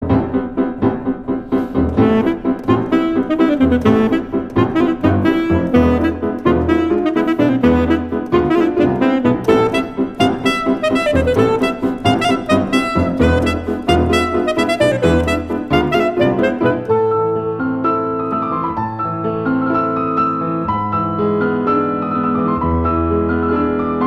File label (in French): Sax, Tango, saxophone; Escapade musicale saxophone